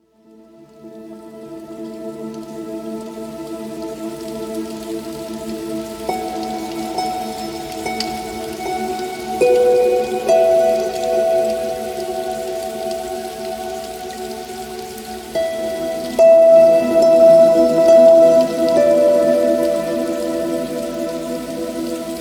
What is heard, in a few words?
Natural Pads; spa; nature